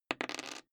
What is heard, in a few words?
dice roll table soft